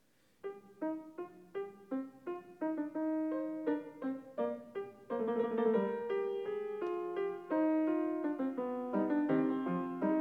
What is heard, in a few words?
Fugue No. 2 in C minor, Bach, Well-Tempered Clavier; BWV871